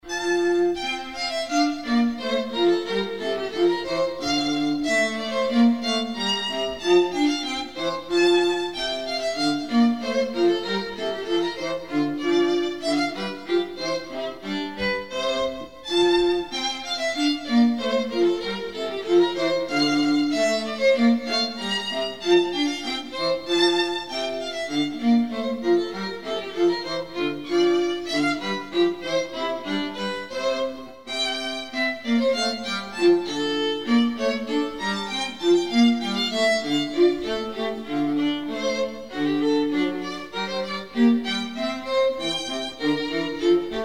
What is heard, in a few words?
handel hornpipe f